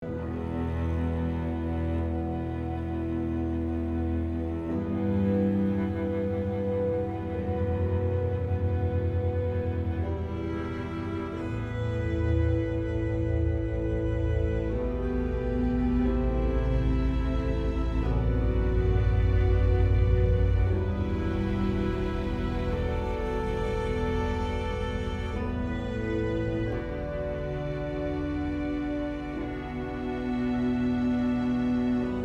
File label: Dialog (Loop)